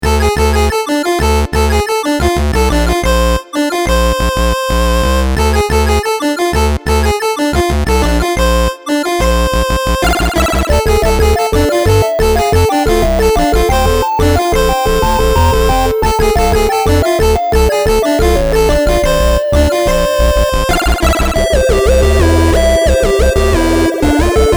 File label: nodrums